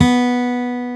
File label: ACOUSTIC G; 12&or6 String Gtr; E-MU Producer Series Vol. 2; More Studio Essentials; Composer